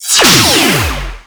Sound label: Teleport; Warframe; Captain Vor